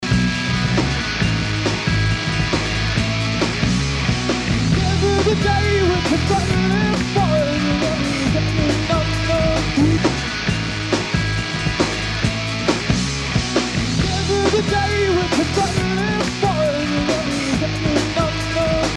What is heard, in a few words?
Drum comparison